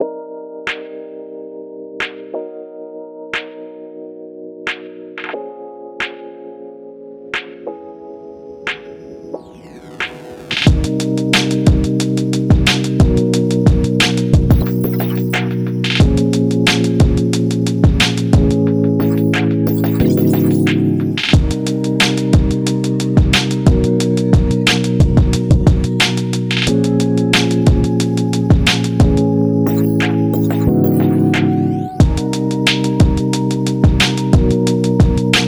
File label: beat